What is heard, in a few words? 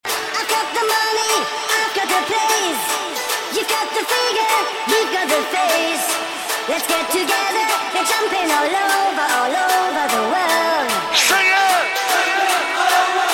message